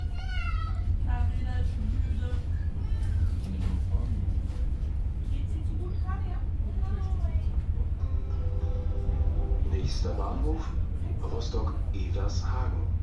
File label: evershagen train to warnemunde